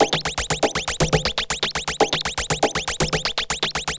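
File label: Sequence Pattern, Keyboards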